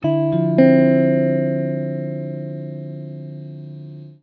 Guitar One Shots Dmaj79; Guitars; Ghosthack; Advent Calendar 2019; Xmass

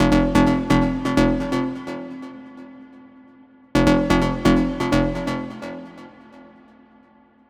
Melodic Loop F 128, Melodic Techno; Watch; Ghosthack; AC2024 - Day 12 - Melodic Techno

